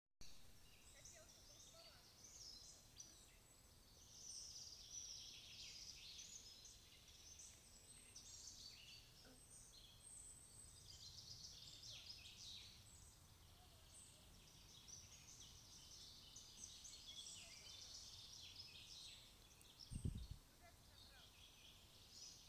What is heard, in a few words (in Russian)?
Утро На Истре